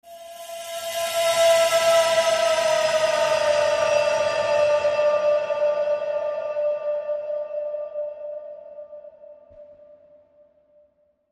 Light Metal Bend

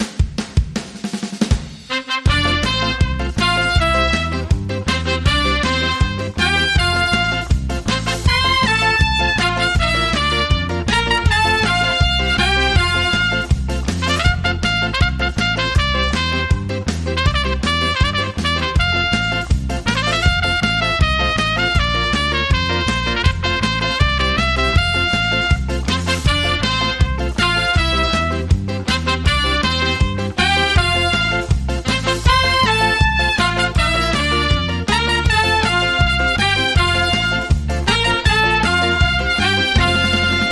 Ska Happy Birthday

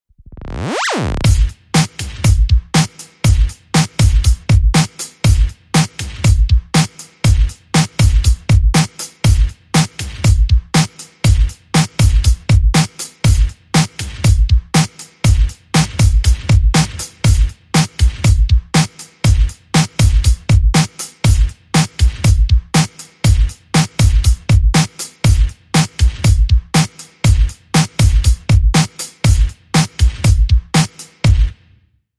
Digital Life Beat

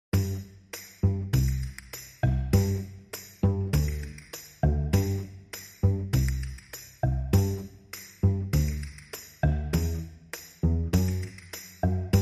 Drums and Bass Only

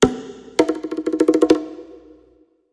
Bongos

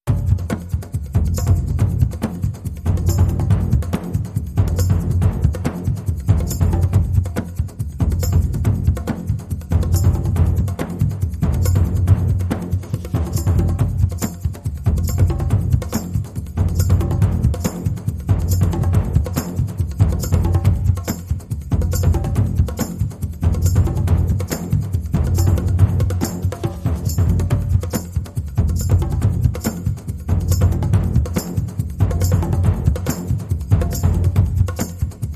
Tribal Groove

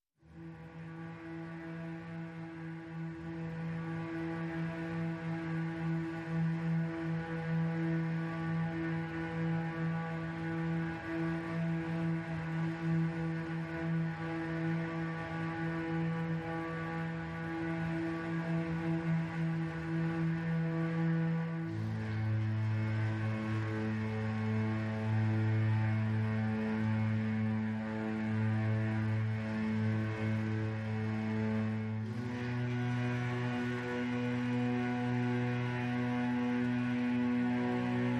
Low Strings Only Mix

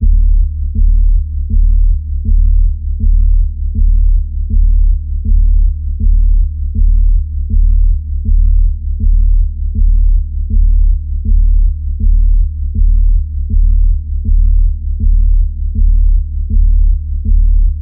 Threatening Doom Pulse D, Key of D

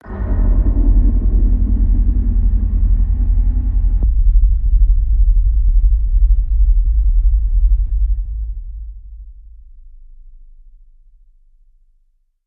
sting

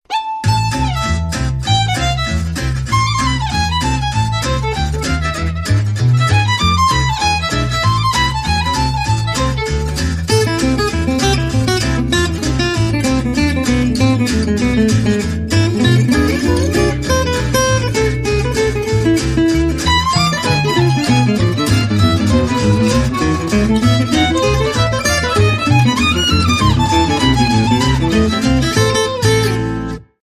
Jazz de Paris

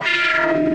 Short Hollow Noise Transition

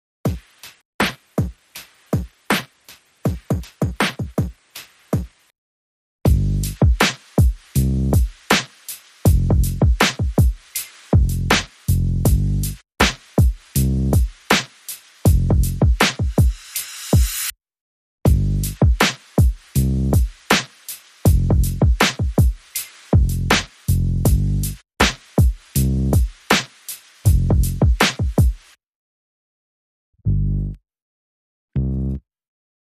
Drums and Bass Only

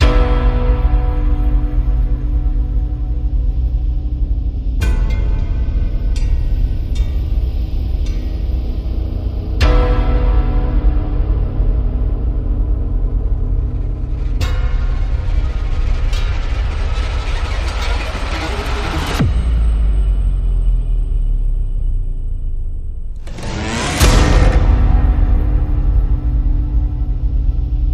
London Bridge Horror, No Melody

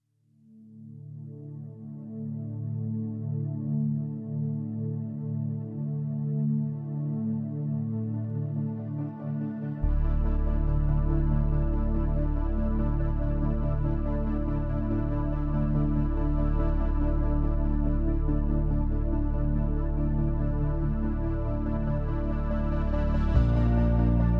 Absorbing Search, Ambient

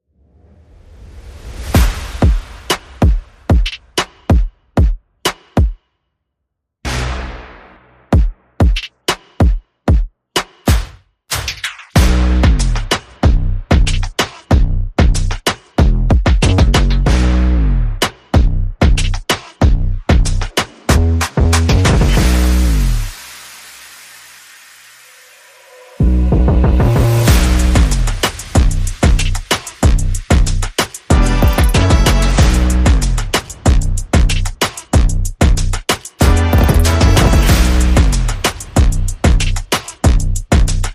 No Saxophone

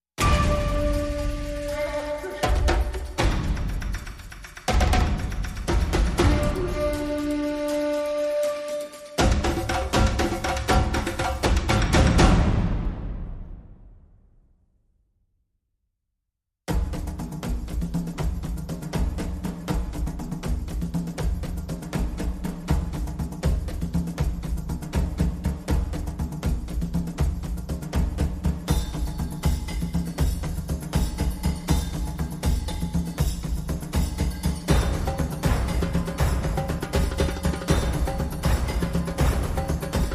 No Vocals & No Didgeridoo

